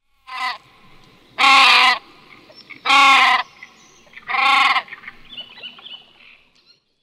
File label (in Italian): Svasso Maggiore Podiceps cristatus; Versi Animali; Il Forum della Fotografia Naturalistica